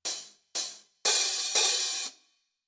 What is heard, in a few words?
Metal HH, Rhythm